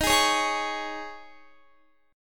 D sharp 7th Suspended 4th, Guitar; D#7sus4; strummed

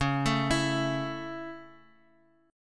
Db Minor Sharp 5th Db down Guitar Open F; arpeggiated

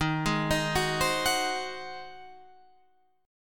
G sharp 7th D sharp

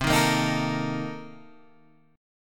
C Minor 13th C Guitar Open G

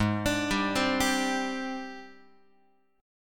G sharp Major Sharp 11th, Guitar; G#M#11; x,4,5,5,4,6; arpeggiated

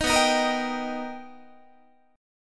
B Augmented Major 7th B down Guitar; B+M7; strummed; 18,19,18,20,19,18; JGuitar

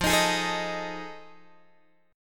F 7th Sharp 9th, Guitar, Open G

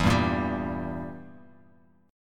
E Diminished E, Bass Standard; Edim strummed; JGuitar

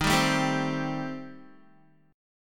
Eb 6th Flat 5th, Guitar; 10,10,9,12,12,x; Eb6b5 strummed

